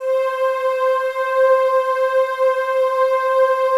PAD, Pads; Best Service; XX Large

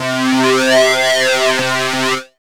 SYNBUZ

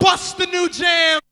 VOX; ZERO-G; Datafile

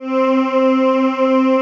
ATMO PADS; Best Service; XX Large

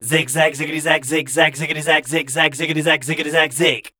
SCAT, Voice Spectral

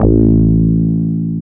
BASS; E-Lab; X Static Goldmine 1

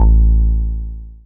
bass; E-Lab; X Static Goldmine 1

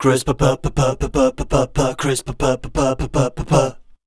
SCAT C, Voice

